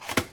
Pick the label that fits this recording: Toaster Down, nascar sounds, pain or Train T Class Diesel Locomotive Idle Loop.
Toaster Down